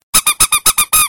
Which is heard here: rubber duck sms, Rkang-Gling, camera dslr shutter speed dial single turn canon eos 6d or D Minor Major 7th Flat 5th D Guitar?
rubber duck sms